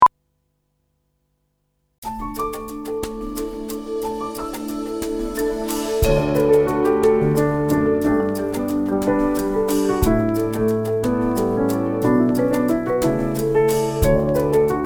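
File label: In Harmony, piano, medium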